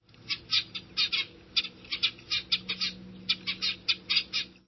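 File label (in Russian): крик напуганной иволги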